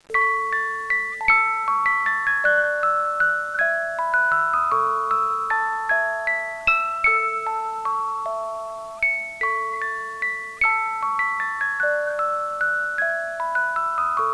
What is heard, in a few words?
18 Note